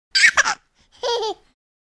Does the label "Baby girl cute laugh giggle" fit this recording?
Yes